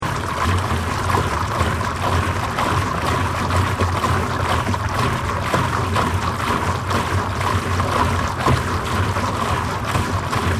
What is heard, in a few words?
PUMPING STATION HOT SPRING STEAM OUTLET PIPE TURBULENCE, Japanese hot spring pumping system; Machinery